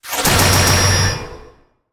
alien game weapon zap gun shot glitchy sci fi impact hit; hard short